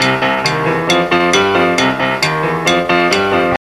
Riff

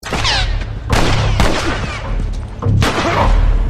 Weapons Guns Shooting

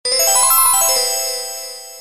logo; konami

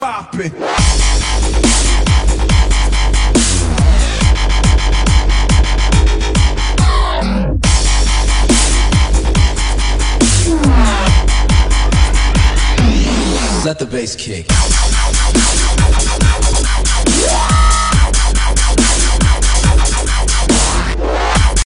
Dubbystep

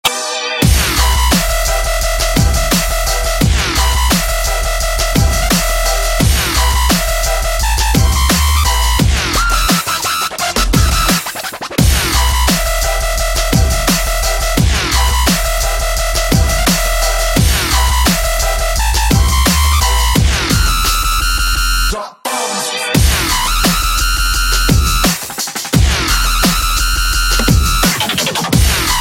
dubstep

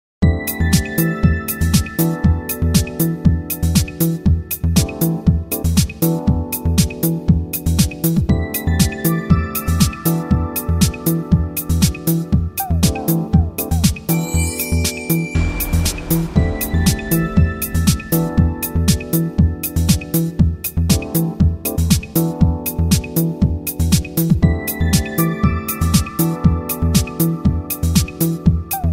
mix rap; Tekken 4

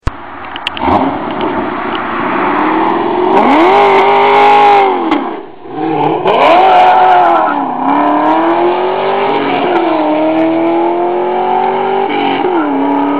start camaro

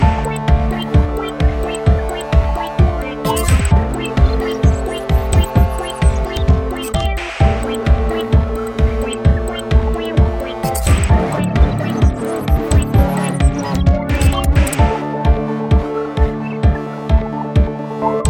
Chords

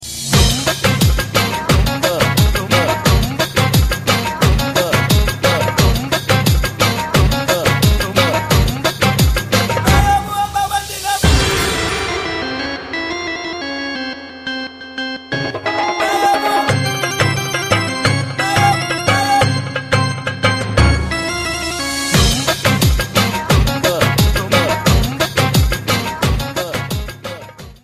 ARABIC

